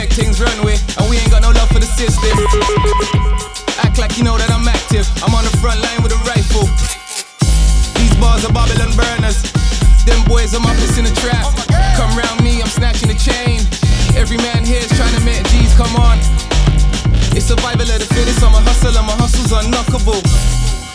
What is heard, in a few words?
Remix Vox